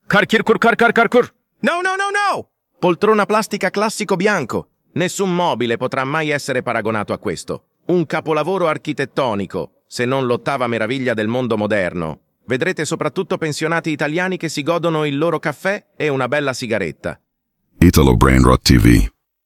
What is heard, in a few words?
loop; poltrona classica